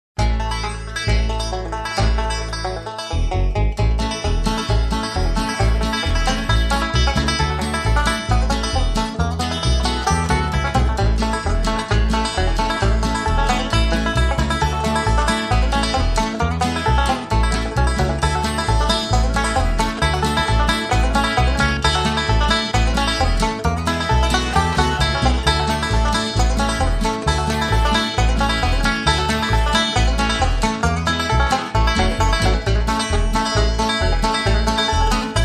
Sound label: Banjo Tune